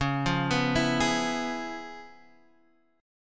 Db 7th Sharp 9th Db down, chord shape; Db7#9 arpeggiated; JGuitar

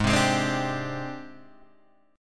G sharp Major 7th Flat 5th Guitar Half Step Down

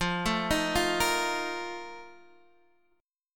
F 7th Add 11th F; F7add11 arpeggiated

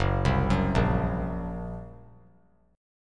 Gb Major Flat 5th, down; arpeggiated; JGuitar

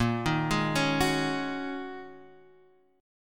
Ab 7th Suspended 2nd Suspended 4th, Bb

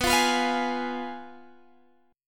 G sharp Minor 6th B

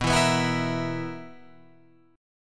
B Major 7th Suspended 4th B down Guitar Open F; BM7sus4; strummed

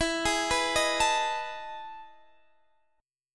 E Major 11th E down Guitar Standard; arpeggiated; JGuitar

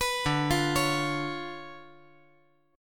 D Minor Major 13th, down

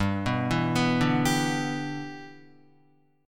G 6th, Guitar; G6 arpeggiated

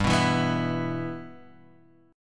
G 6th, Guitar, Half Step Down

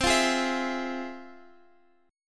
F Minor Major 7th, chord

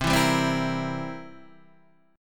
C 7th C down, C7 chord; strummed; JGuitar

